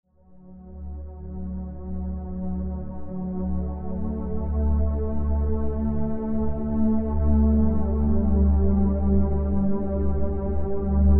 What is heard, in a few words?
theta background track, hypnosis programs